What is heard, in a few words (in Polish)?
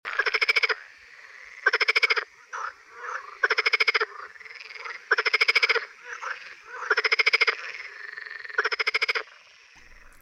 Zaba smieszka; Kurs PTTK; Przyroda; Tajemnice puszczy